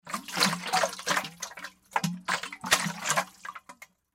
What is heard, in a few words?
Container Shake, Container Shake Slosh Bucket; Shaking; Blastwave